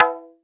Electro Percussion, House; Drums

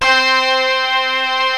LEAD, PEAVEY DPM; OTHER SYNTHS; Rubber Chicken Software Sample Bank

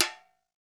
REPIQUE, Latin Drums; Latin Drums & Rain

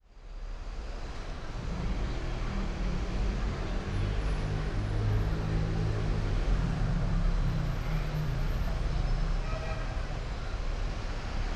City Soundscape, Loud

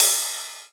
Rides, Cymbals

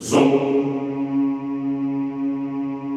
ZOOMMM, Extended Classical Choir; Best Service

